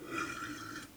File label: We post our burps.